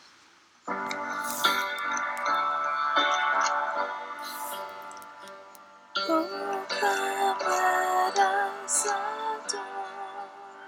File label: O Come Let Us Adore Him Vocal; kids music library